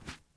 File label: glass jump; teardown; ps5 windows xbox series xs